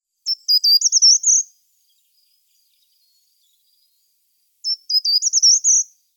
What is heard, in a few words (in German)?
Gartenbaumlaeufer Certhia brachydactyla; Vogelstimmen